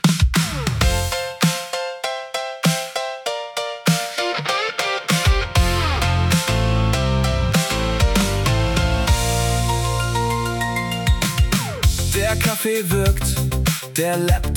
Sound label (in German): Firmen Jingle